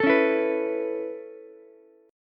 B 7th Suspended 2nd Suspended 4th down Ukulele, chord; B7sus2sus4; strummed; JGuitar